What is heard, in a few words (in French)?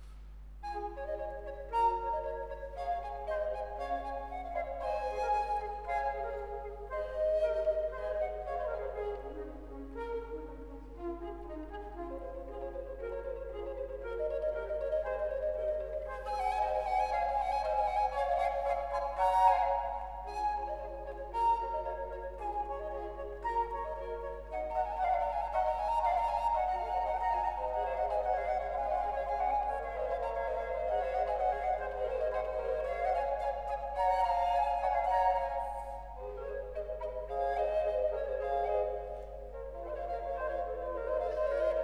Trio sonate pour 3 flutes a bec et ou traversos; Quantz; concert Polyfolies; trois flutes a bec ou et traversos